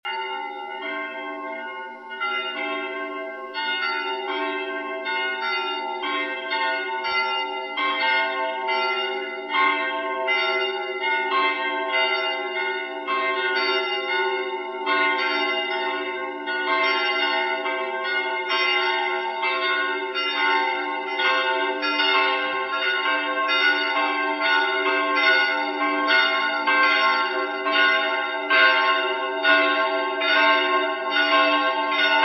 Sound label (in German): Glocken, Ostpreussen; Johannisburg